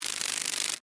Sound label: Insect Wings Fly, Steady, Bug; Animal, Insect, Wings, Fly, Steady, Short, Shake, Bug; Wing Sound Effects